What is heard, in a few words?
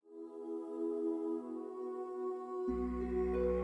harp voice bass cl; rimo soundtrack